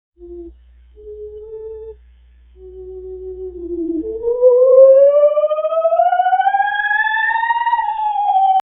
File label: paranormal